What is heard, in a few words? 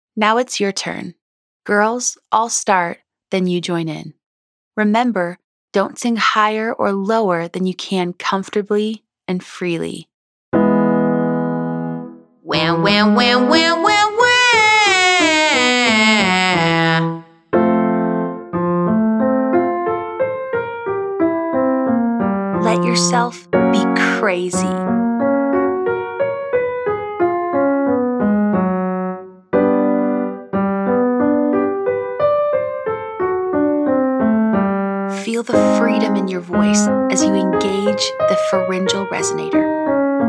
Wah Let Go Exercise Female